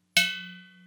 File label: vase